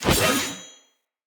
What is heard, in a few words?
Short Sword Heavy Whoosh; Weapons; Player